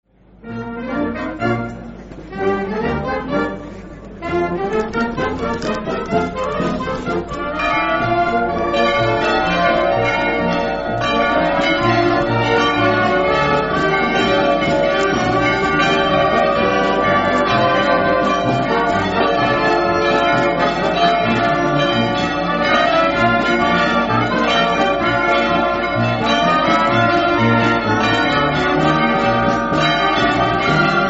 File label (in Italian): campane; lestizza